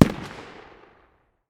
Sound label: dmr medium distance, 7.62x51mm; tacz-tweak-pack; taczmechanics